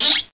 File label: Stairs Door Open